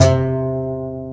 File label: ContraBass Balalaika C3; Planet Earth; Digital Sound Factory Planet Earth